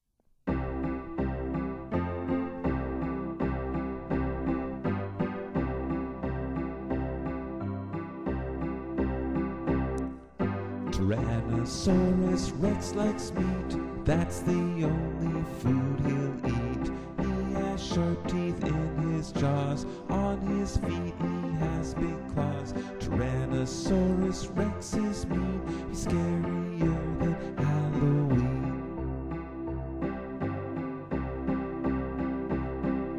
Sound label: tyrannosaurus rex song